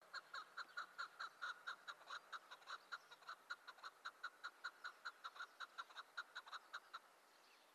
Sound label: Chukar; کبک چوکار